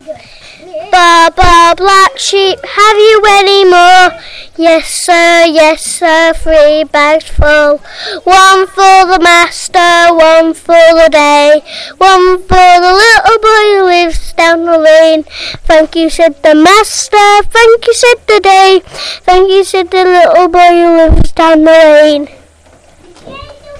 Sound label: baa baa black sheep